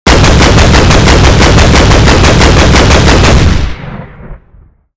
zpu fire, weapons; ModernWarfare

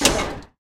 airbrake end

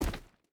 footstep rubber boots stones jump land; Rust

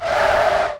squeak; War Thunder

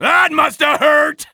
taunt hurt

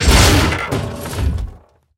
tank impact generic; tanks; War Thunder

